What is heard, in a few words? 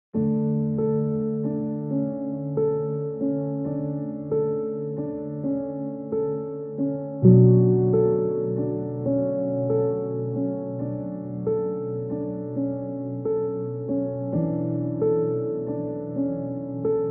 lullaby version